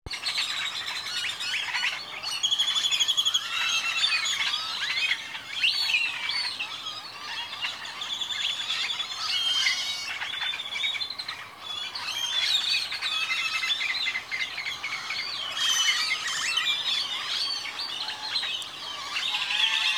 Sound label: Birdsong